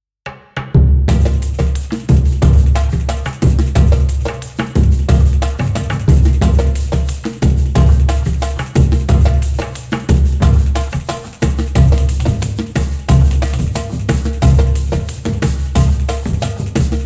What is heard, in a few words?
surdo; afox